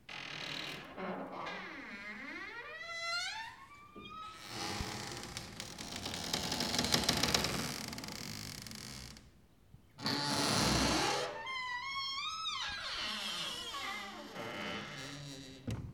Wooden Door

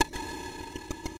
Tick rev

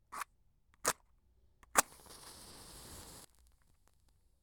Lighting A Single Match

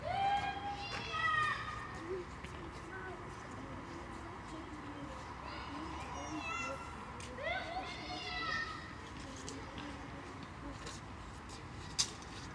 Kids Play Hide And Seek Suburban

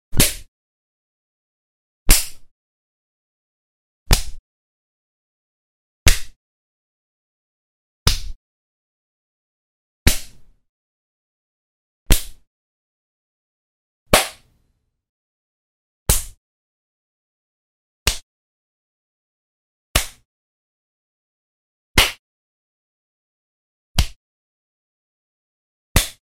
Slap In Face Sounds

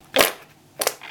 Wet Fart

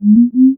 Prompt User For Response

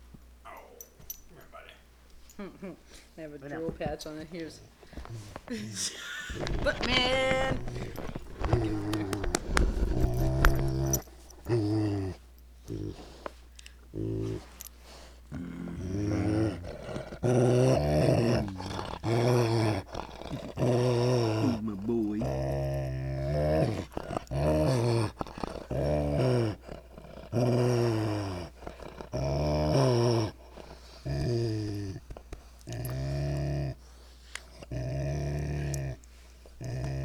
happy growl; bruno

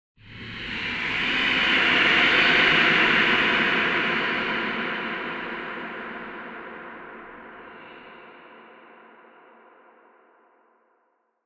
Ghostly Transition

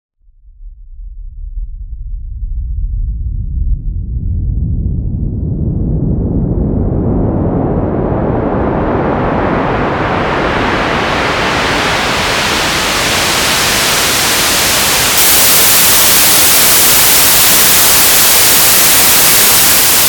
More Noise

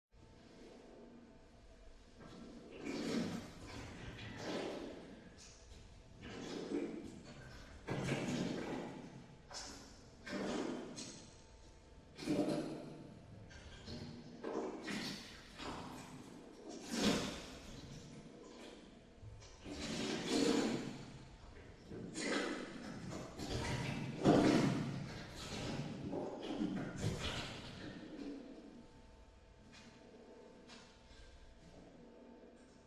Water jug

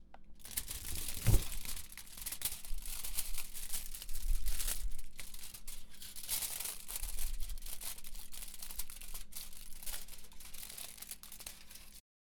Foil

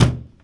Hit Impact

